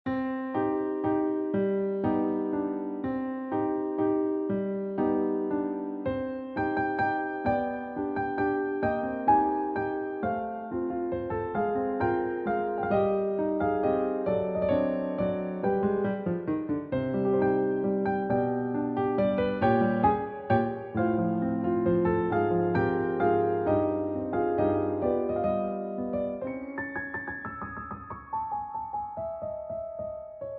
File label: Aesthetic Music Only